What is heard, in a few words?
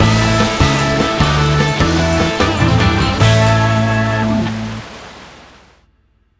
scatterWin; wild water